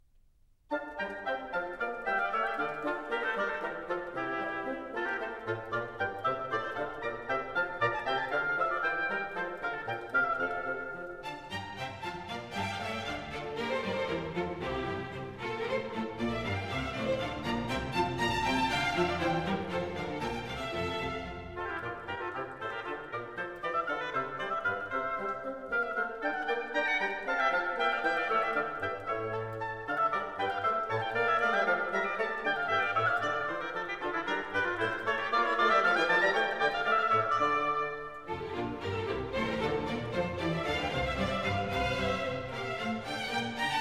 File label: Bourrée; Music for the Royal Fireworks; Handel Masterworks; Klassika